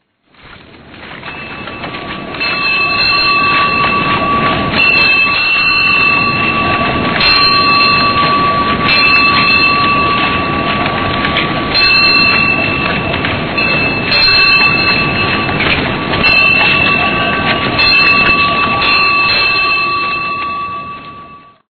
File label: pack pony bell collar; 17 18C